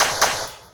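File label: PERCUSSION TECHNO 140, Techno Drum; Techno2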